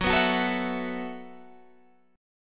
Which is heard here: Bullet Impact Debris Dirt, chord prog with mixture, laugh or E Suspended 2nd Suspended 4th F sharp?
E Suspended 2nd Suspended 4th F sharp